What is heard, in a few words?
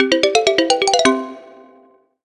Happy Ducklings; Wii Sports Resort; Canoeing